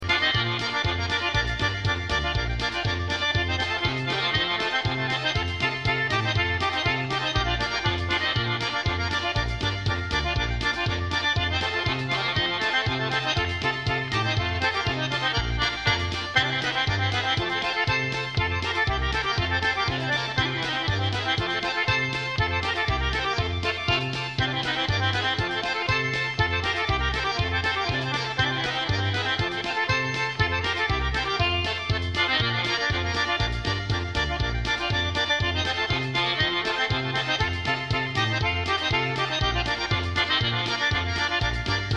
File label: clarinet polka